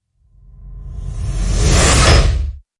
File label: Big Wipe Wipe; Ninja Tracks